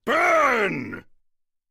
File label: burn